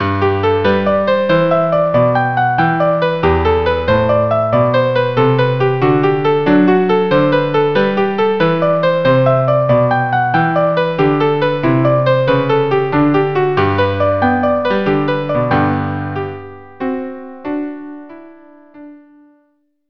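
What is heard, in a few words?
viola cello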